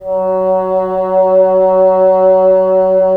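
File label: wind bassoon; LA Composer vol. 3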